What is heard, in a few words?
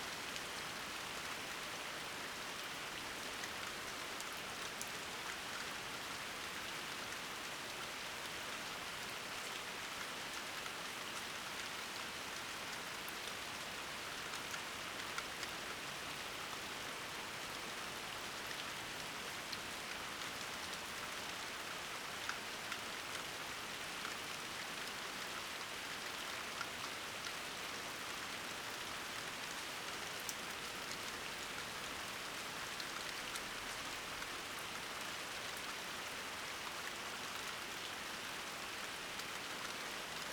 Soothing Rain Sounds